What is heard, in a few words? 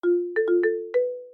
marimba